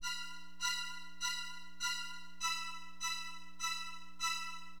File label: STRING E2; Acid Jazz Funk; Acidpads